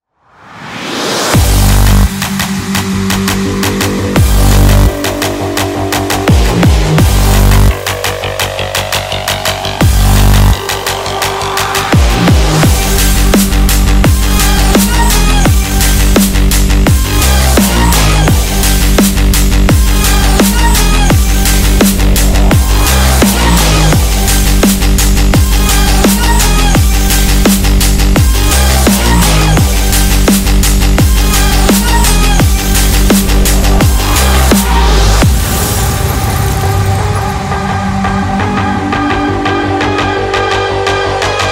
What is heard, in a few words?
Trap